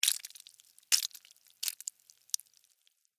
Blood Heavy Splat, Spill, Drip; Horror; Blood And Guts Sound Effects; Blast Wave

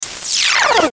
Descend Short Rewind; Descending Sound Effects; Blastwave

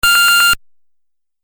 Beeps Video Game

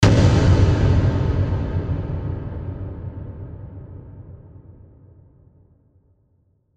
Hit Reverb; sound design; Blastwave

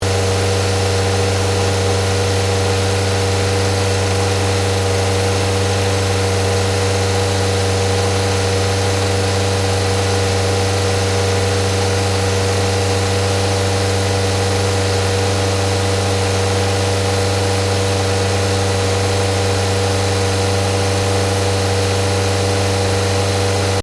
Car Ford Focus Idle High Engine Bay; Ford Focus hire car; Cars; Vehicles

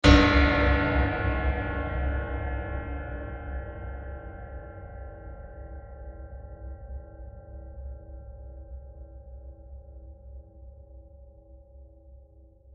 Huge Metal Impact; Blasts; Impacts; Crafted Signals

